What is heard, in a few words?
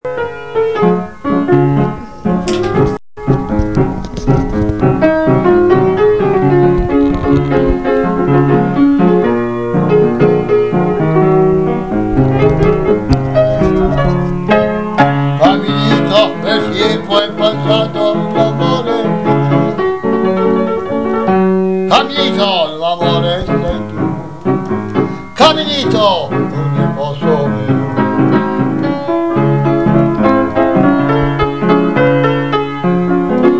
piano